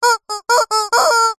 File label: leisure toy squeaky rubber chicken squeeze cluck cock a doodle doo